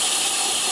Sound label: spray spraycan; Lethal Company